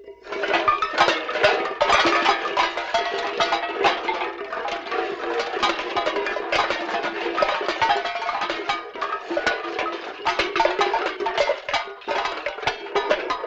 Tin Cans Dragging; Vintage Cartoon; Foley

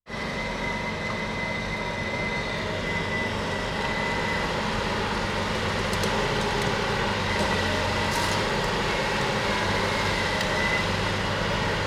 Front End Loader

